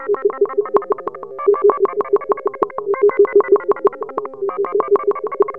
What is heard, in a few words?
rattle fx; Zero-G Ambient 1